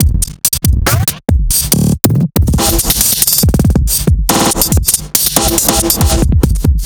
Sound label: drum